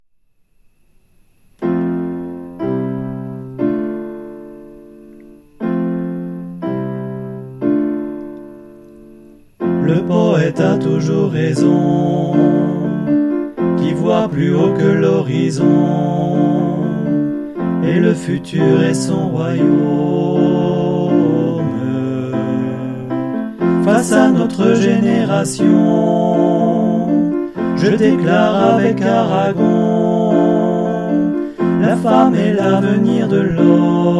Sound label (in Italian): TUTTI